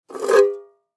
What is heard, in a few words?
Tin Small Pick Up; Foley; Pick Ups; Objects; Blastwave